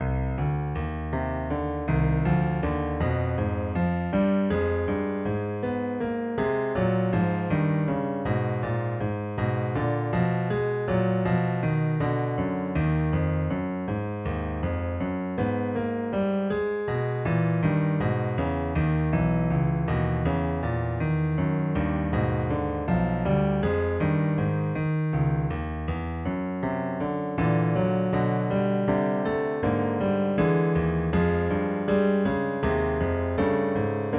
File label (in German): Marsch